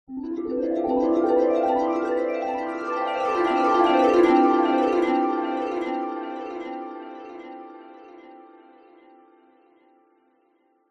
sound design magical rising falling twinkling nostalgic